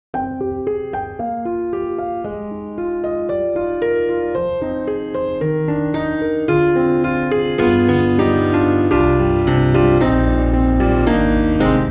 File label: Soft At Heart; Native Instruments